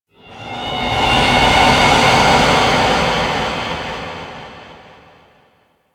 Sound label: Rising Vocal; Props; Ropalolyst; Warframe; Ambience; Sentient Devourer